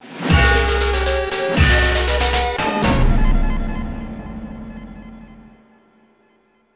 Jingle Death Stinger; Bionic Commando Rearmed

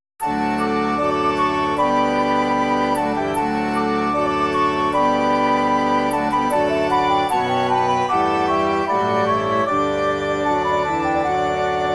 Concert (with Ocarina); harvest moon back to nature

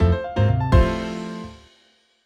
Jingle Trump Table Round Res Win; Clubhouse Games: 51 Worldwide Classics

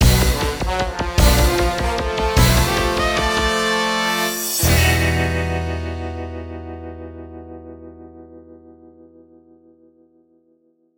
Mission Failed; GoldenEye 007

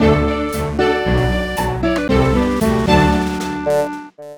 Competition Victory Jingle; My Horse & Me 2 Riding for Gold; nds

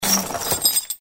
chain

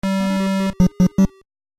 Damaged Boat; the blue marlin nes

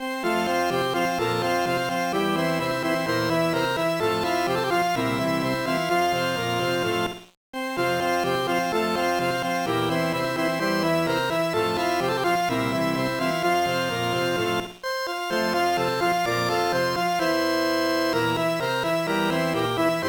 BGM; Dokapon Q Monster Hunter; gba

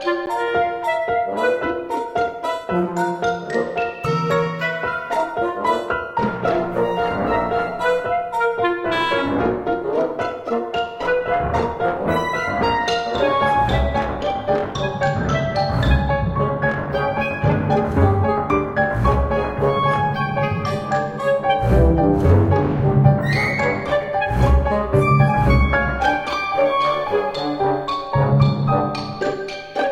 Quirky

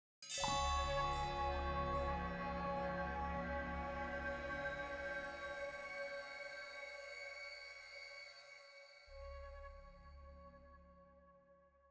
Spirit Trial Stinger; inkwater Marsh

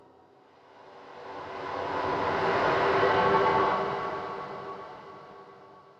echoes; thief 2014 gamerip

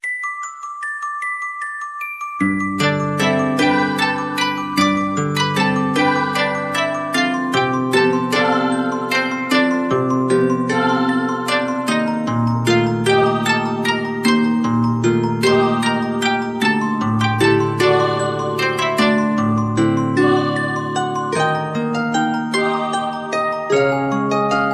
Jingle Rpg Map; Taiko no Tatsujin DS Dororon Yokai Daikessen

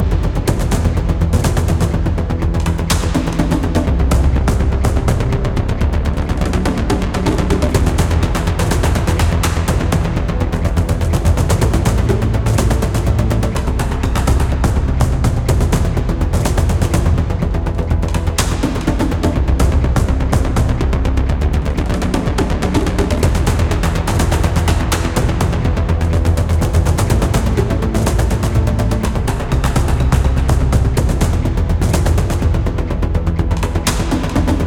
Action High Layer; Fortnite Save the World

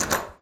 door lock handle locked; ProSoundCollection v1 3; Doors

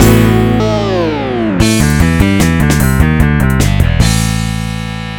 You Lose (MIDI Version); Asphalt Urban GT 2

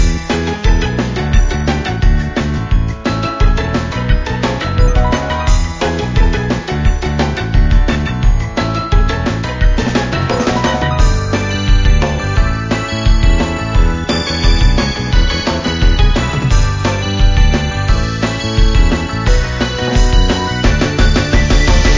bgm box gacha loop; onegai

